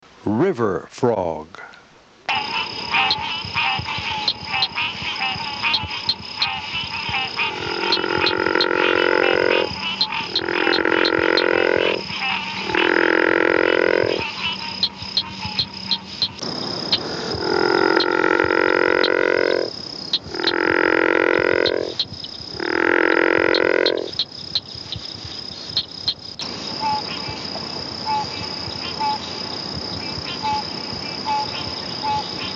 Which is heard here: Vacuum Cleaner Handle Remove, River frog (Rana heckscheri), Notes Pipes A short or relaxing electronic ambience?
River frog (Rana heckscheri)